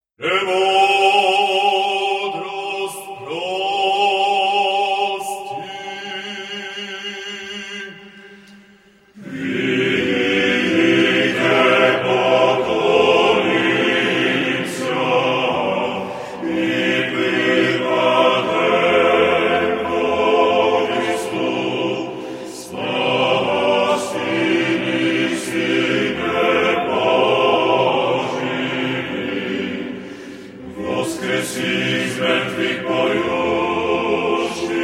Bulgarian Liturgy, Pridite poklonimsia (O, come les us worship), obikhod chant